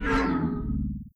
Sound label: teleport; xrfragment